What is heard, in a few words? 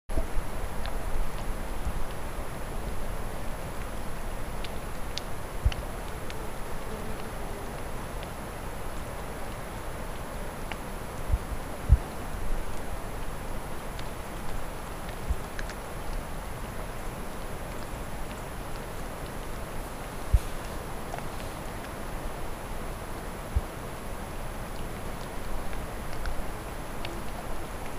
Lake Lapping